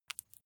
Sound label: flesh wet greasy squish single